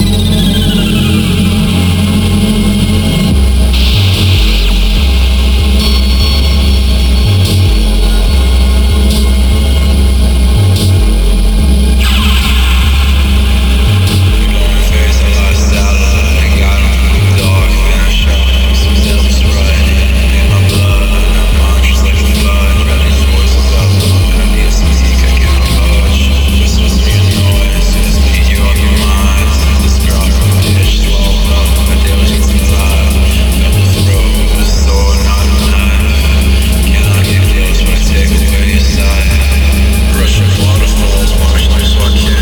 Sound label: staticwaves (toned)